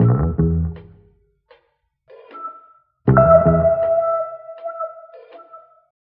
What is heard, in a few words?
keys, phonky loops